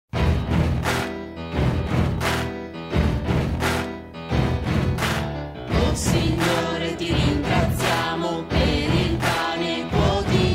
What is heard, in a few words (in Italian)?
Ti ringraziamo blues